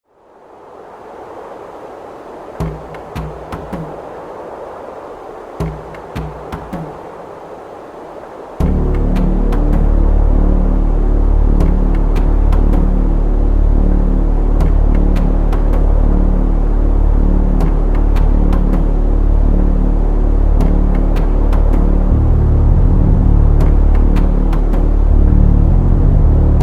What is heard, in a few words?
Outdoors; Serious Sam; Valë o' Kings